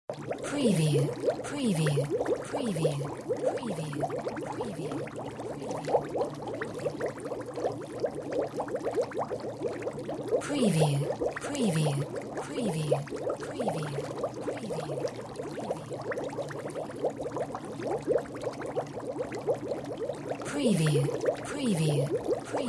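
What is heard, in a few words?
WATER BUBBLES ALCHEMIST; windy beach audio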